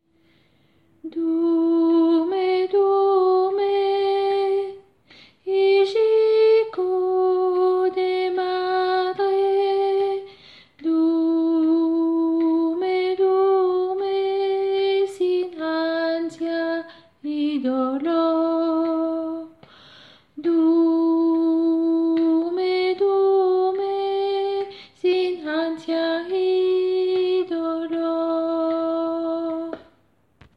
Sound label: mezzo; Durme; 1er couplet